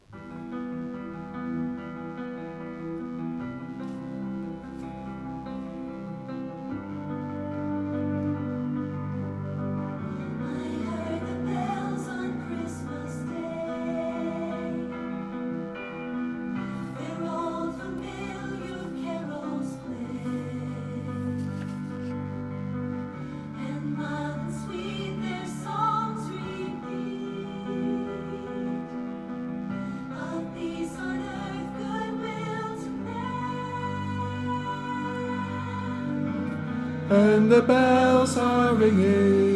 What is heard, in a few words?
I heard the bells Bas; I heard the bells on Christmas day